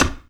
glass, player steps; quake2

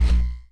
energy, player steps; quake2

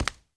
flesh, player steps; quake2

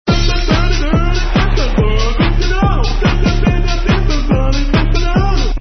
uhhhh; svencoop

